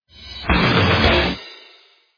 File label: steel hammer stinger; ident